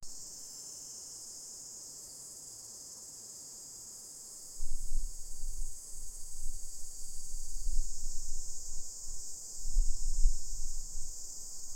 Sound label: summer afternoon insects